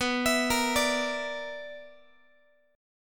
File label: B-Minor Major 7th Double Flat 5th, Guitar; BmM7bb5; arpeggiated; x,19,19,20,18,x